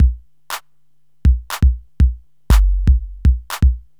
RYTH

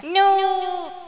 fall; Amiga; worms dc; tykes

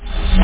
Suck; Sneech

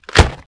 bod strk, bugs; Starship Troopers

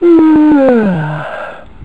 Yawn; PB Sleeper 2.0

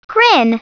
GRIN

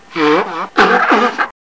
elephant

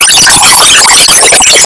Bell Tower Single, Bells & Beepers; 24,000 sample rate; The Hollywood Edge; Audio Interchange File Format

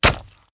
cow get hit; Immortal

